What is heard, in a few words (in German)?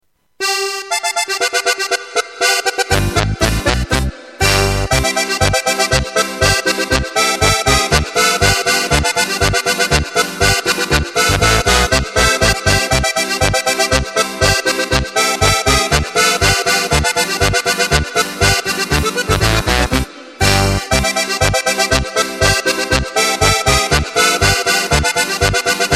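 Begrüßungs-Polka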